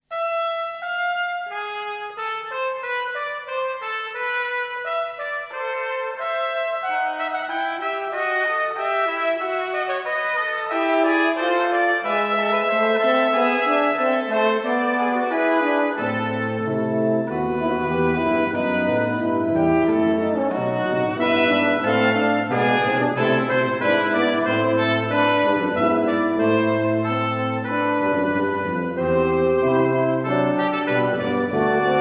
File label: Fugue; Nikolaos Mantzaros; Fugue from 2nd Latin Mass